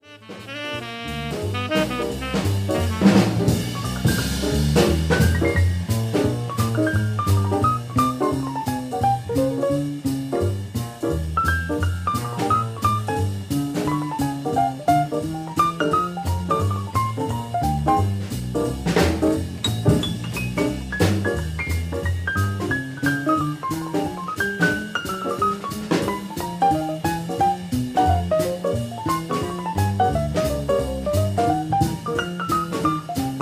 piano solo

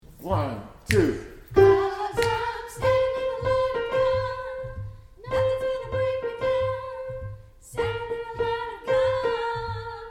Soprano part

